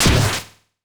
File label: grab; deltarune yellow translation